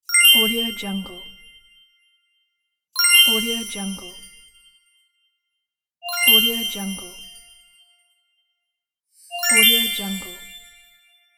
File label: diamond sparkle; sounddivision